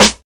00s small reverb snare sample g sharp key; snare drum samples

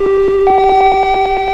sequenced beeps

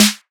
rich top end snare drum sample g sharp key

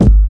low steel kick drum sound g sharp key

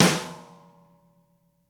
perfect snare drum f key; snare drum samples

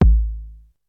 huge steel kick drum a key

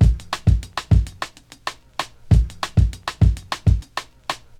104 bpm high quality breakbeat a sharp key; drum loops

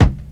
thick kick single shot C key

